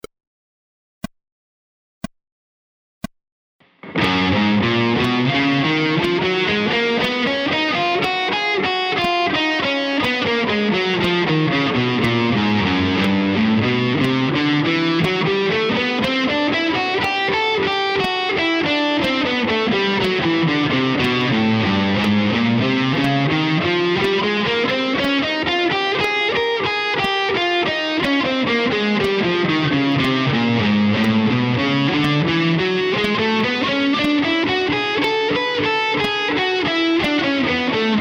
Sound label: scales combining rhythmic variations slow, guitar